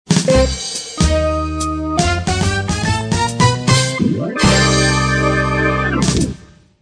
Success Jingle ~ Normal; Neighbors from Hell; NFH

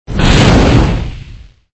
Ignite Sound; Extra Lives

